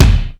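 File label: big foot bass drum; East West; Global Sounds; HR16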